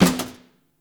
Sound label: Stadium Snare Destroy; Ambience; Gameplay; Warframe; Nineteen Ninety Nine